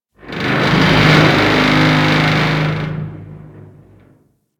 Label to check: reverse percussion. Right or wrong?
Wrong